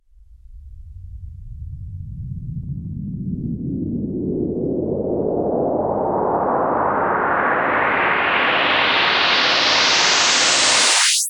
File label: Bpm85 C Sweep FX